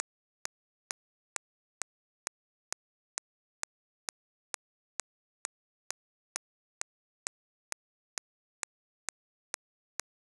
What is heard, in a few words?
precedence